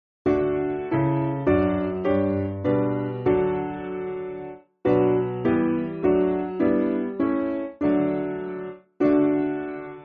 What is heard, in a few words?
Piano, church music